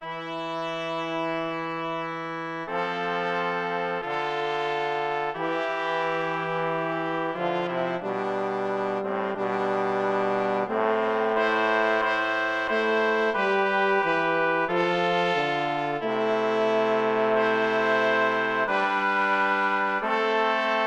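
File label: 2 trumpets horn trombone; Josquin; mille regretz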